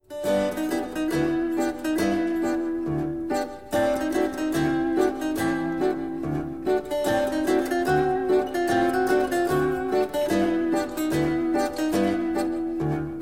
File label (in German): zither musik; der dritte mann